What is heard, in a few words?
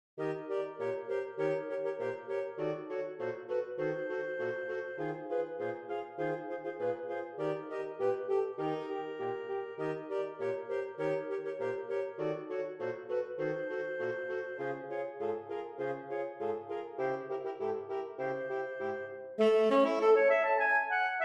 Villageoises Staccato; Saxophone Quartet